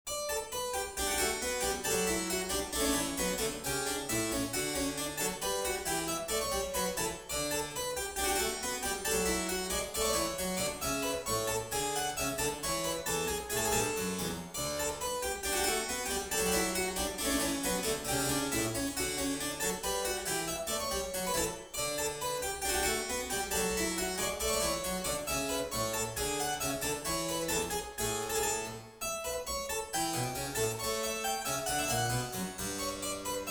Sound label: Suite in E flat major, Gavotte, Keyboard Works; J.S. Bach; French Suites; Suite No.4; BWV 815